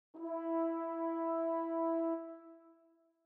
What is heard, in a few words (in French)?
mi trombone